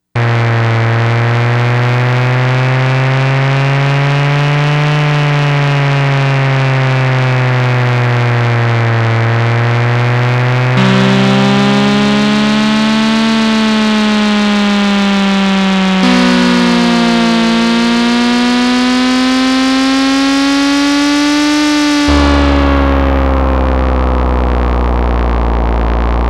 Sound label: Racing Car, Yamaha DX100; Factory Patches